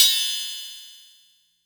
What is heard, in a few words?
Metal